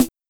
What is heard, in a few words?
Linn, LinnLinn Drums